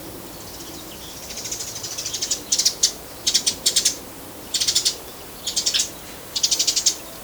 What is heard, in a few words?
tree sparrow call; Tree sparrow (Mazurek)